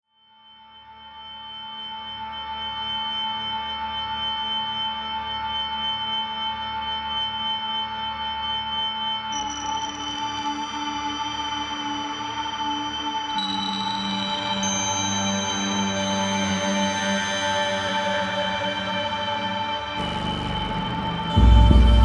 Generative drones from the DN - some pedals - ARII - Minitaur